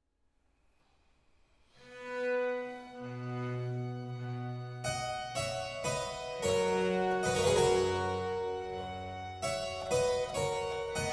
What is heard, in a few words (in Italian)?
concerto C allegro, flute, violin, viola; violotto; galuppi